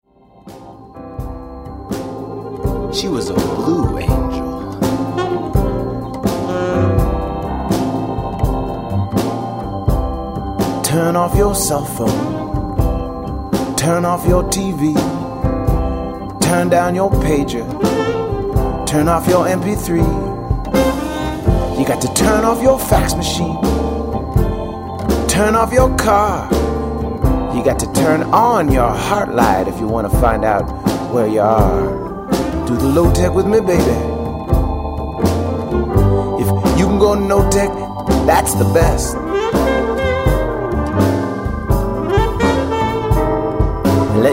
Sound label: cellphone blues